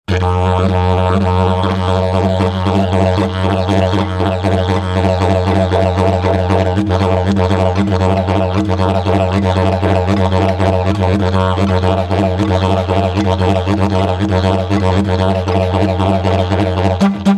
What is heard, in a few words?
Dith Dhu Dhirrl Dhirrl Improv